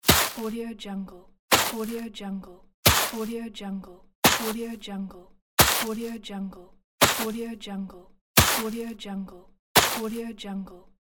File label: shovel